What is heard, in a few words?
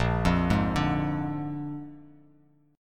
Bb 7th Bb down, chord; arpeggiated; JGuitar